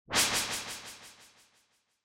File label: Noise Sweep Delay